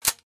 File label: Winchester 12 pump series